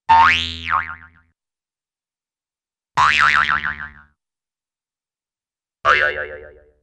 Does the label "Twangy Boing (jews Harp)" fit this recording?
Yes